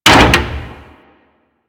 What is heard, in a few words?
Derelict Mover Metal Clunk; Ambience; Props; Warframe; Orokin Derelict